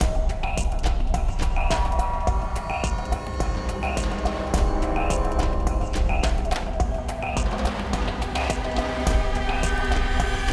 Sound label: Stealth Loop